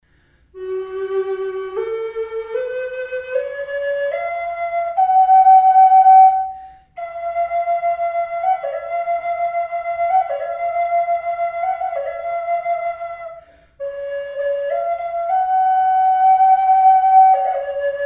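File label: reverb, flute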